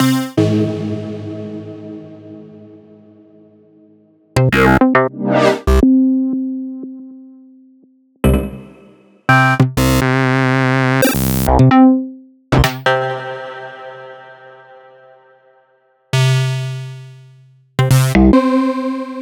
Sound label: Electronisounds Synths; Sliced